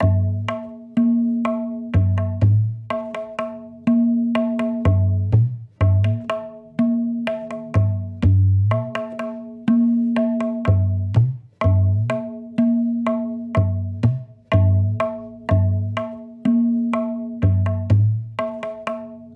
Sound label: Tabla 124bpm